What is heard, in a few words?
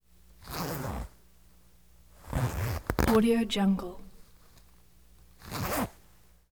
zipper pants up and down